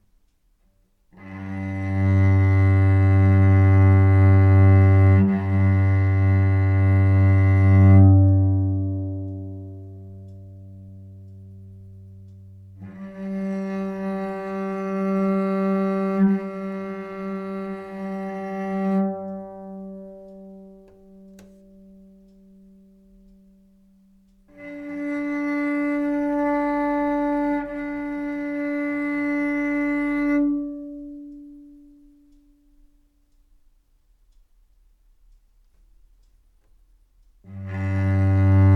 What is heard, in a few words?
cello g string overtones